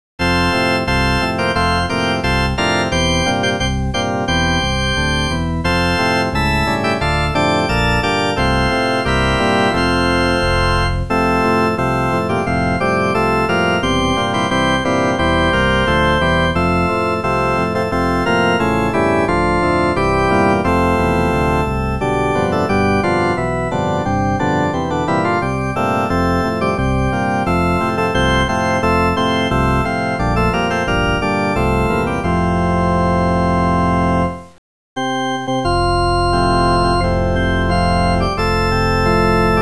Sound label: Organ